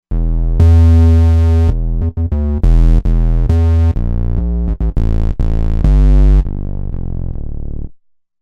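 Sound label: Bass Velo Sub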